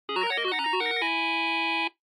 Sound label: Bingo Machine Digital Result Speaker